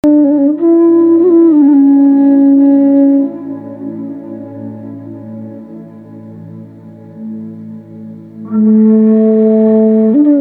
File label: flute